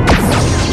Weapons; Suliban; trek

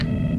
Ship Comm Acknowledge; trek

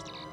Bajoran Commbadge; trek

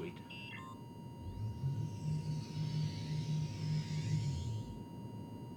Replicator; trek

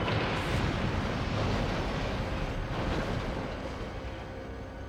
Runabout Crash; trek

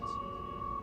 Being Hailed; Trek

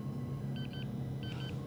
Science Lab Sounds; trek

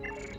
Channel Open; Trek

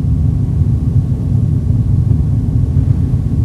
Ship Hum; trek

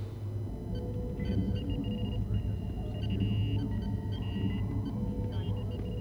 Ops Sounds; trek

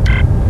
Door Control Key; trek

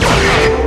Vulcan Rifle; trek

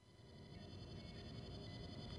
Med Tricorder; trek

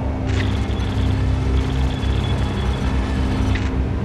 Communicator Static; trek

